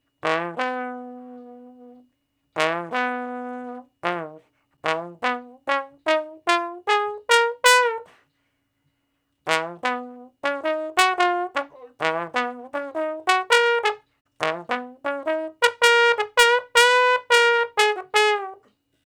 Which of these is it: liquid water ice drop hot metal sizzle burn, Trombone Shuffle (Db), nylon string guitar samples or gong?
Trombone Shuffle (Db)